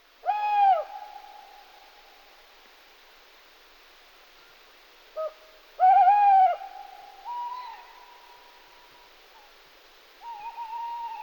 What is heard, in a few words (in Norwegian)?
kattugle